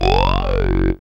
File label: SYN, Roland; Sound & Vision - Gigapack II; Morpheus